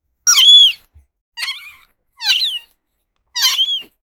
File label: kitten squeak